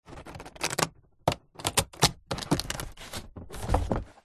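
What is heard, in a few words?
foley cardboard box empty lid flaps movement handling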